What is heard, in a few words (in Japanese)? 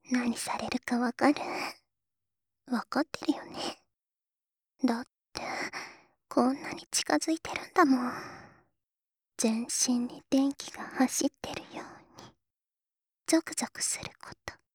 髪の毛くんくん耳舐め, ASMR; 堕ち